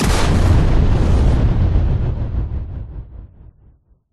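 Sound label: projectile explosion; skbt astro mothership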